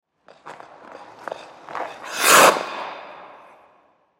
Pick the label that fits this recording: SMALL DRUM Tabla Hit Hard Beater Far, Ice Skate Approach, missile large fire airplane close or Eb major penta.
Ice Skate Approach